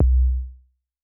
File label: F2 Pure Tuned Kick, Tuned Sub Kicks; One Shots; Touch Loops; ElementsOne; Abstract Rhythms